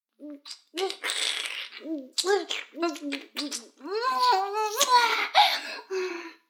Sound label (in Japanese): フェラ音声, ASMR; 逆時間停止されて